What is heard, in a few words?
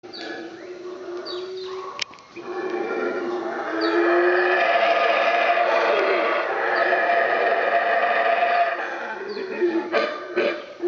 howler monkey